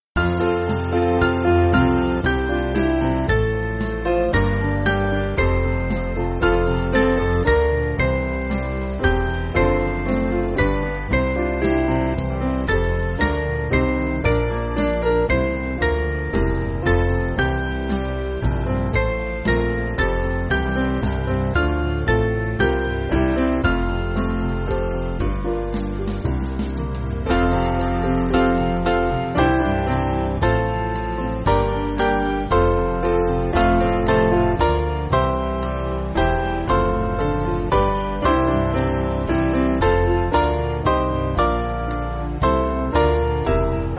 Band, church music

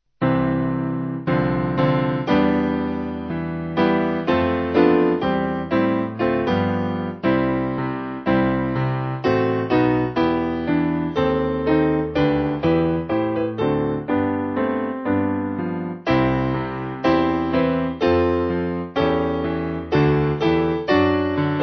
Lord For The Years, Piano

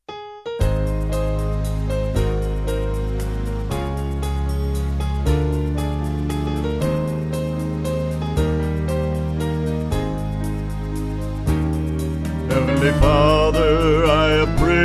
Vocal Band; Heavenly Father I Appreciate

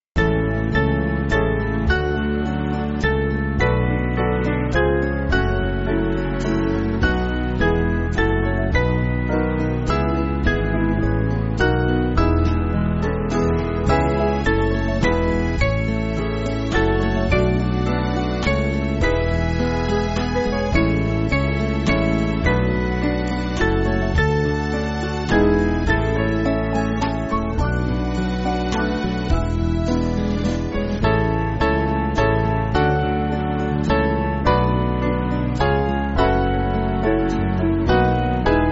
Band, church music; Take Up Your Cross; Meins Lebens

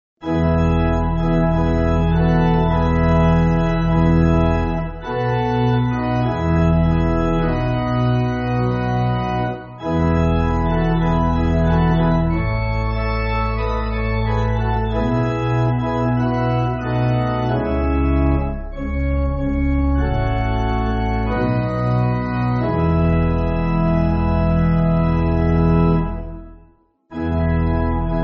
Pipe Organ, church music

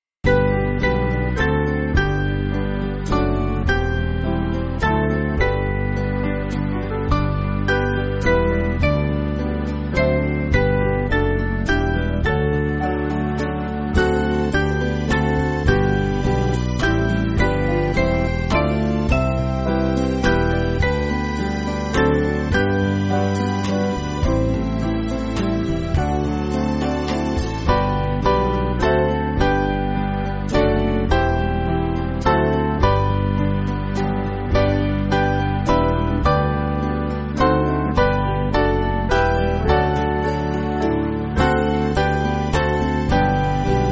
Band, church music; O Lord Of Heaven; Almsgiving

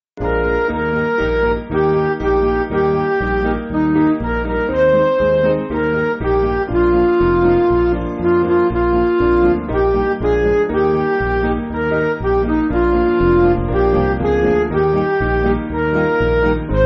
Midi

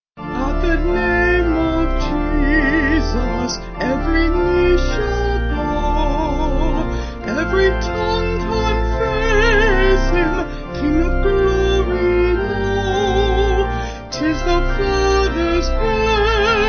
Vocal Organ

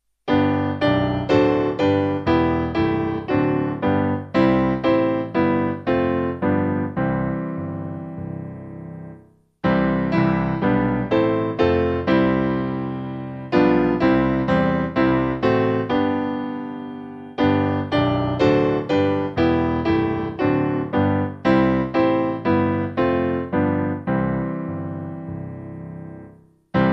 Lord God The Holy Piano; Tytherton

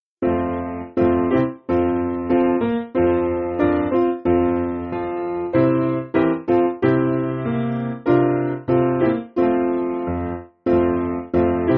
I Will See You Piano; Matz

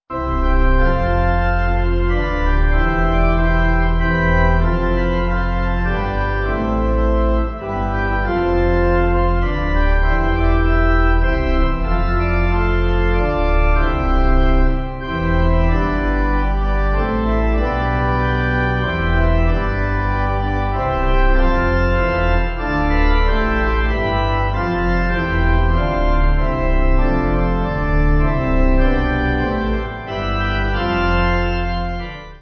When Jesus Lived, Pipe; Thaxted

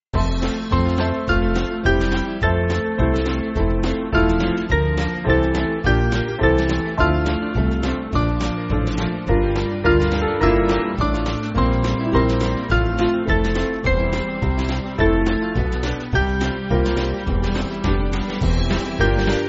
Band, church music; O Son Of Man; Charterhouse

